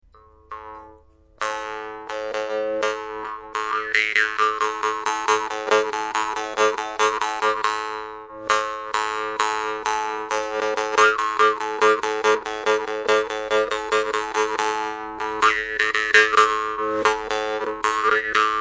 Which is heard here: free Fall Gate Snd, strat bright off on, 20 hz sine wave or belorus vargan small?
belorus vargan small